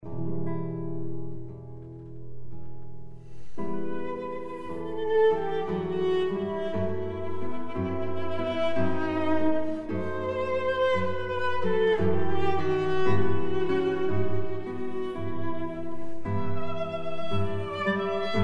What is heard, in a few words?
Adagio in G Minor Arr. for Guitar Cello; Tomaso Albinoni